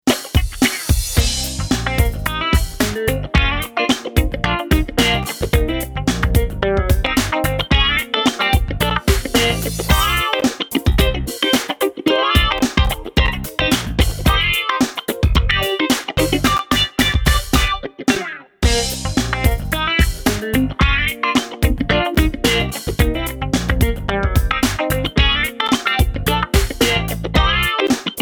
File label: Funky WahWah, JazzGuitar